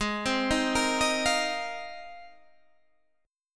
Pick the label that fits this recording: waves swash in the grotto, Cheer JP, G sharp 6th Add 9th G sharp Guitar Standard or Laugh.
G sharp 6th Add 9th G sharp Guitar Standard